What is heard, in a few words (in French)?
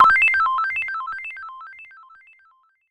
arpege electr